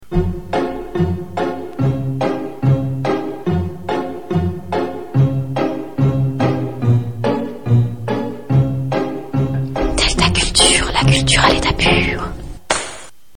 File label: Jingle